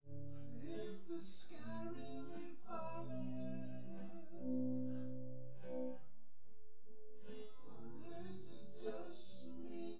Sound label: nodrums synthconv